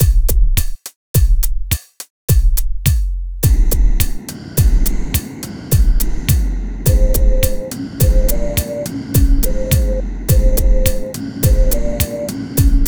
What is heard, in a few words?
rain on slate